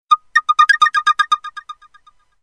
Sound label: Sms